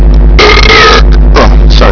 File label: burp sorry